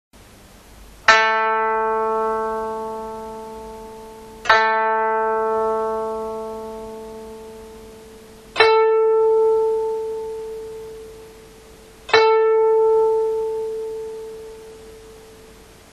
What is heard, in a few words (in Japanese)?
調子替, 箏 調弦; 双調の平調子にする(一は箏高音の二と同音)